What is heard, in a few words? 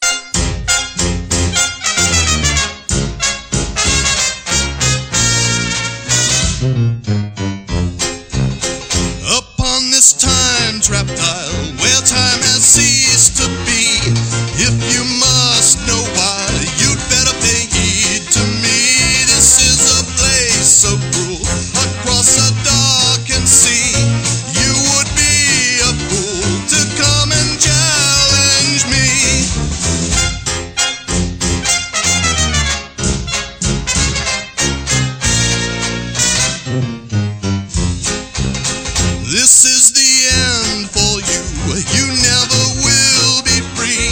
Jazz Band